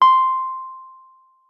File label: WURLITZER, C6; Xsample VOL-1; 90 s Sample CDs; WURLITZER RS